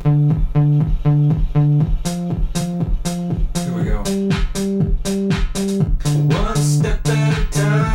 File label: reverb